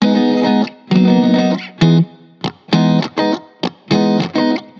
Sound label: Guitar 100 BPM G#min, Loops; Ghosthack; Ghosthack Advent Calendar 2021; Capture